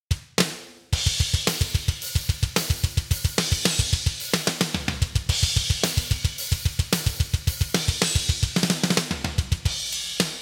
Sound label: Layered Bass Drums